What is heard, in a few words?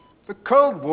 sample, male laugh